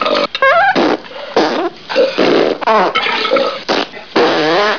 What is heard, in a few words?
body sounds